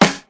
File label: bassy snare g key